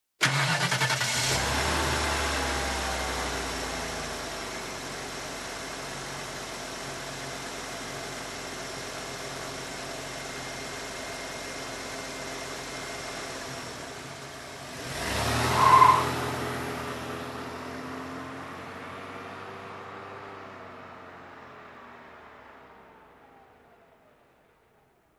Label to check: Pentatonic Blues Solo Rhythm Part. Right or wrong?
Wrong